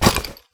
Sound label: board holster; hammer; melee; tfa starwars melee weapons pack